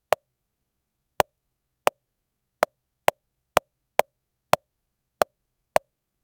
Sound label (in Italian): click morbido acuto stile, buttons